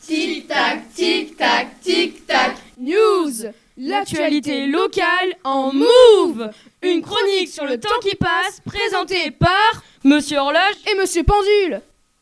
jingle

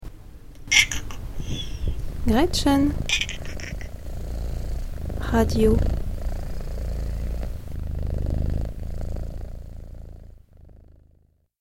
jingle

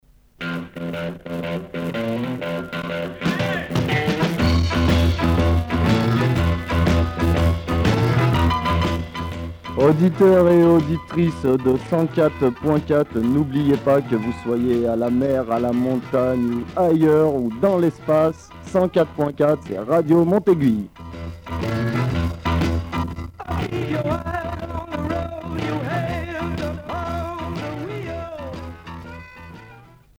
Jingle

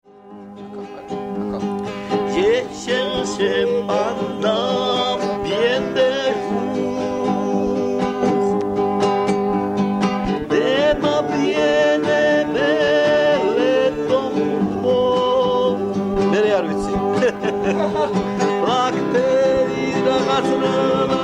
suliko

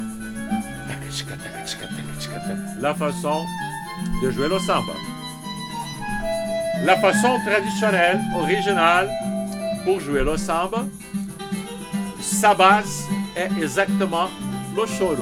bossa

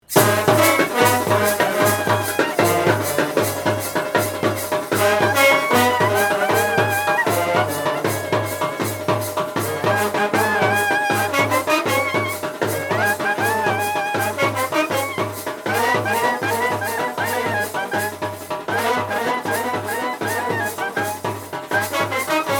brass

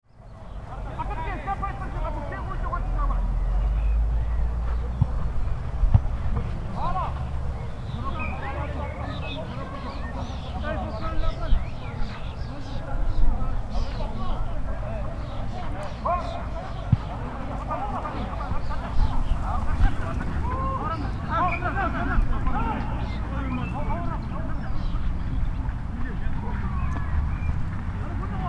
penalty

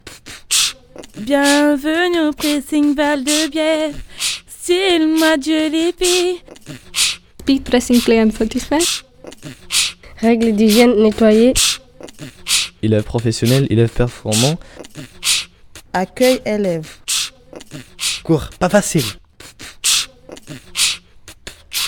jingle

